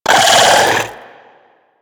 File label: Arachnoid Wraith Vocal Attack Short